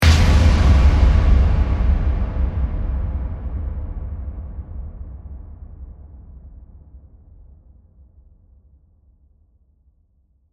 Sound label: Hit Drum Reverb; Multiple, Production Element, Imaging Element, Accent, Transition; Hits; BlastWave